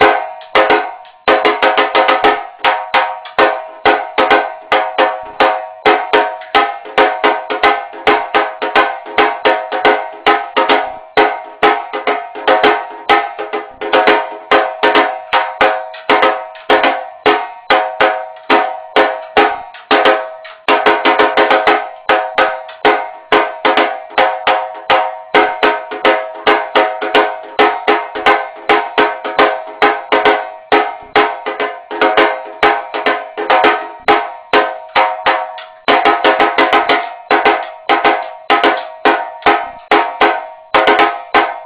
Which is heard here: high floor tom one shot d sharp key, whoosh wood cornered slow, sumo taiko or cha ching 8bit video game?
sumo taiko